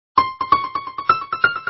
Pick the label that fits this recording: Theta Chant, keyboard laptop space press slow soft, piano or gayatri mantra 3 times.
piano